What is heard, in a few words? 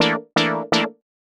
125 stab E, Keys, Nu Jazz House; PowerFX - Nu Jazz House